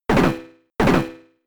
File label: Beat Up 2hits; attack moves; Pokemon; Gen 4; DPPL HG SS